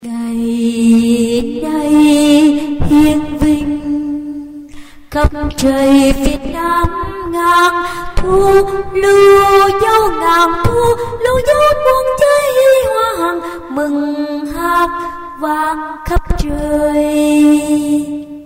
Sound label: alto